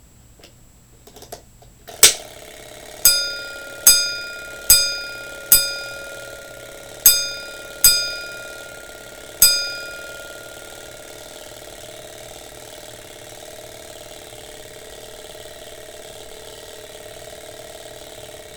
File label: Simplex Pull